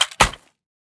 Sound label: riffle drop gravel; STALKER; IWP